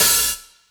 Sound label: drumbreak open dry; Hiphop